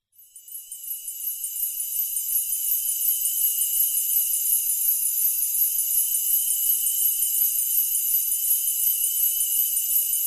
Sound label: noisy, animal